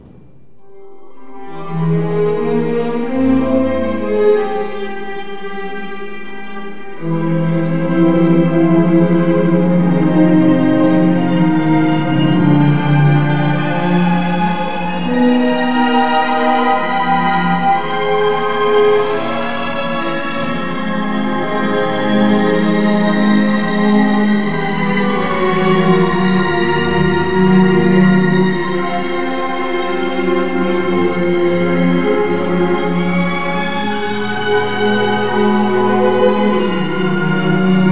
couplet violons, Les cordes

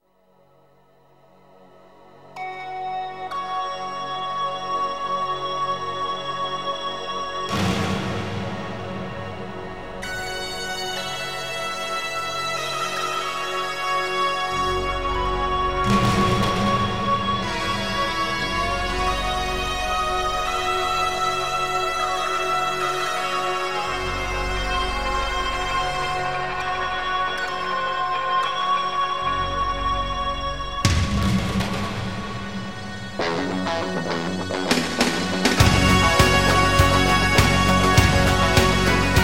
Guitare

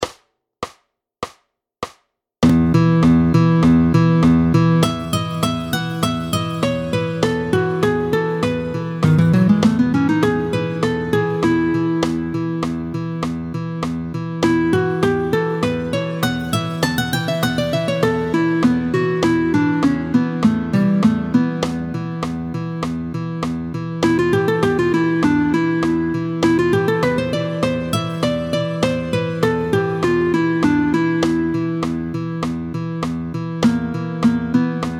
Les 7 impros modales, Récapitulatif des 7 impros modales en mi, tempo 100

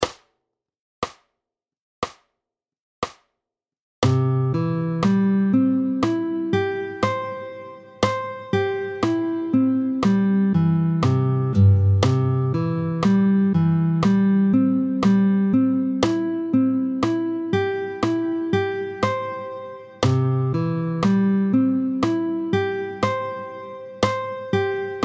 Arpège de Do majeur, tempo 60; arpèges au médiator

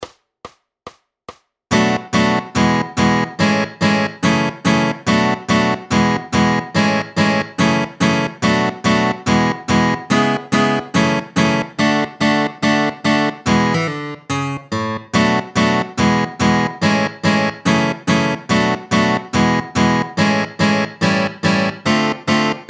Rythmique en La

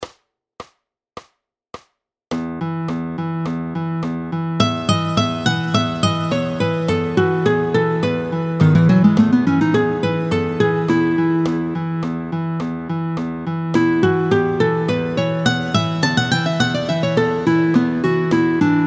Impro modale

